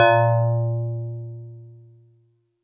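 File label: StarPiano DX100 A#5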